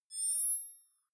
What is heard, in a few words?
zoom out light high